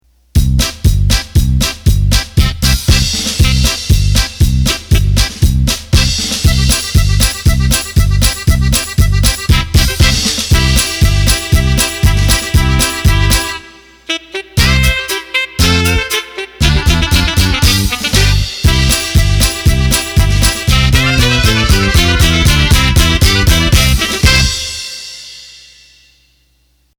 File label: Polka, accordion